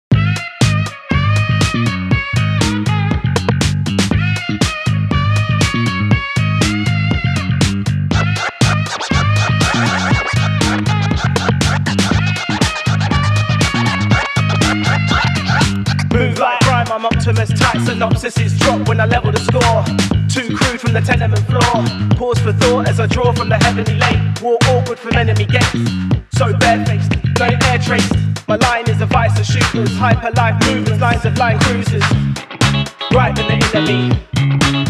slap bass groove and raps; weeklybeats 2024

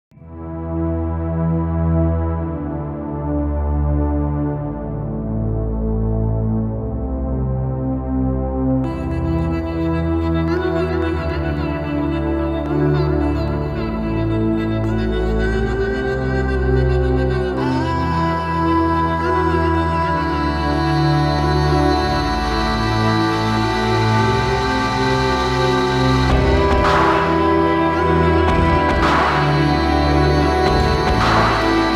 110bpm; visceral

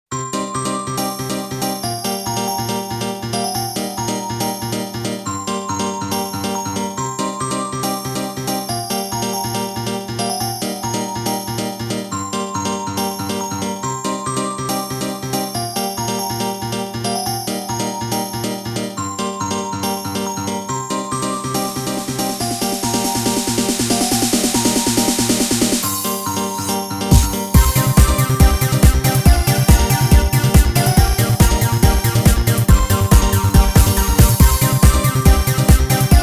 bells m01d italo; hej; italo trash; weeklybeats-2014

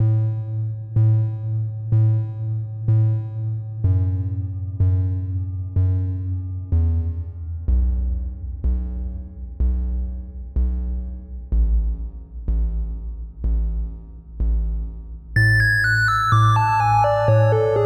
falling down softly

